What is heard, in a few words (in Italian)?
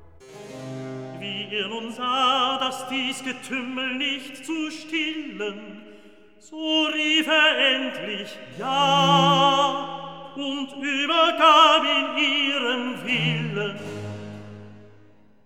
Recitativo; Wie er nun sah; Handel; Brockes Passion